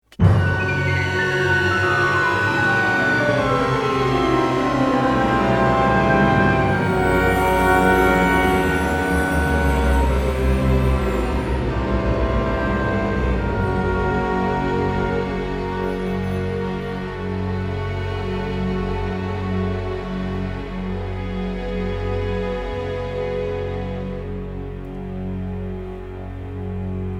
Descending

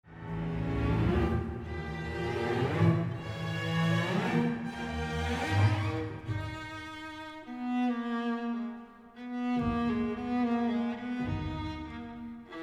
Viola Solo Adventure Theme

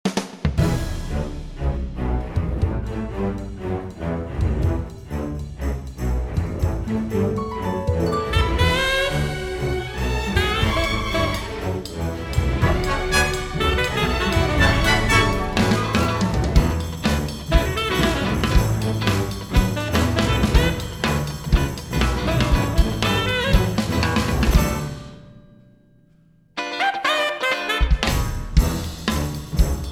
Funky Orchestra

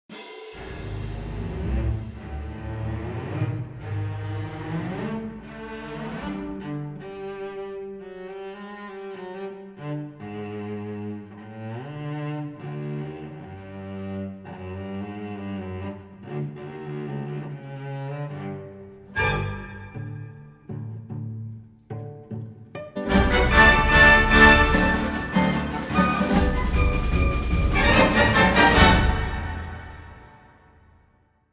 Cello Solo Adventure Theme

